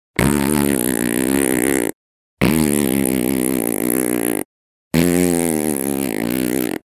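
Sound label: fart sound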